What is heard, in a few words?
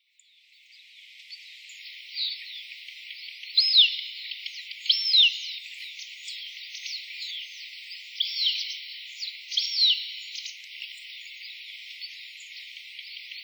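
Eastern Marsh Harrier; chuhi; watarase; koe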